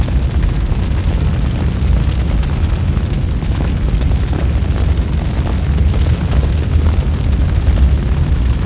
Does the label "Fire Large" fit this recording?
Yes